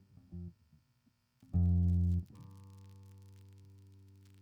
bass

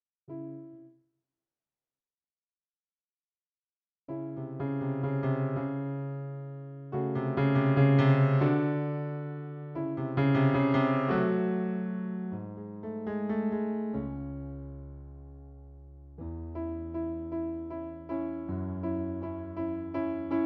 Piano

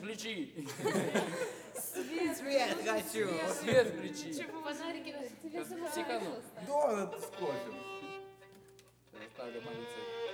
Overhead

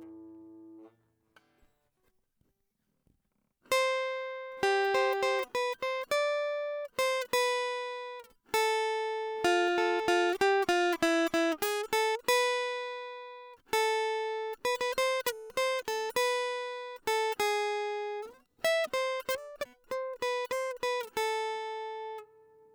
guitar nice

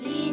Fade

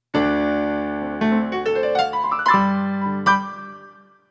piano

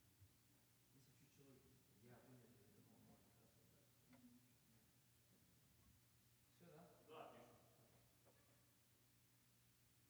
Piano

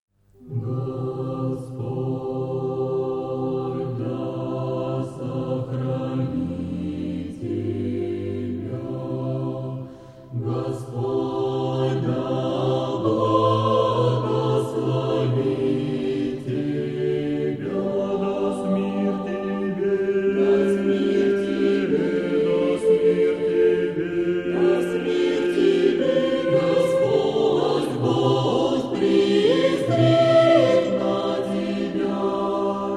Chorus

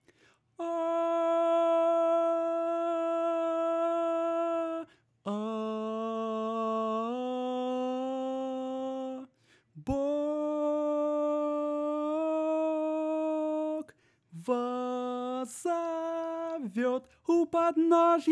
tenor

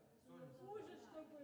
Piano

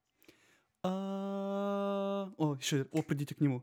alto

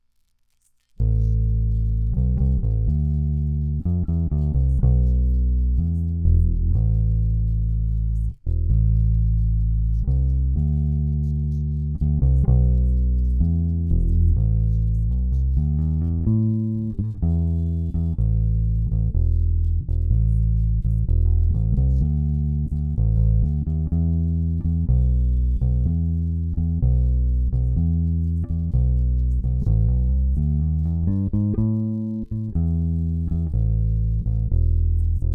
bass

